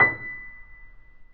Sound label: PP B 5 off, Grand Piano off mic; AKAI S6000 CD-ROM - Volume 2